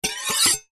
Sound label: knife blade scrape draw metal fast